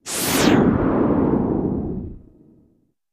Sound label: High Low Whoosh, zaps; High Then Low Pitch, space Ship Fly By